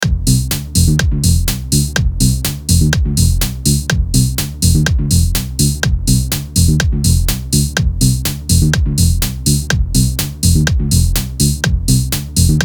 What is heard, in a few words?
QUIRKY Fmin 124BPM